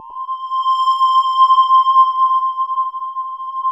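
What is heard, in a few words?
square, synth pad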